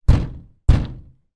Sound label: household wardrobe door glass panel heavy knock x2; zapsplat